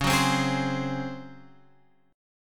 C sharp Major 7th Suspended 4th C sharp Guitar Open G